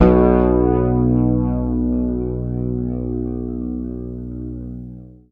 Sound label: B, Bass; Sampleheads; Will Lee Bass Library